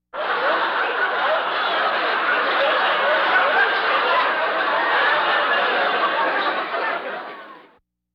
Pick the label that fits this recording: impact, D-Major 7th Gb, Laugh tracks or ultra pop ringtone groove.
Laugh tracks